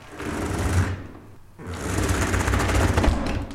Knarrende Türe

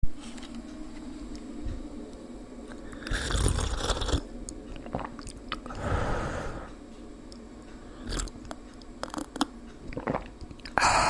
teetrinken

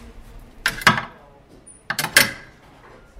Garderobe

